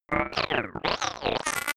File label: robot small helping droid